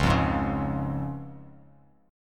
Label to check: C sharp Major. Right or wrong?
Right